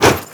fireaxe light; tfa starwars melee weapons pack

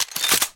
weapon pickup; weaponweights content

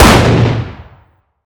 fire dist 50ae pistol int; gmod; arccw uc commons attachments

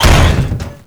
impact soft, physics; gmod; lvs framework

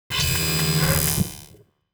aim; tridroidznnpc

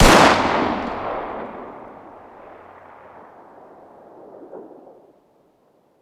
fire dist 9x19 pistol ext; gmod; arccw uc commons attachments

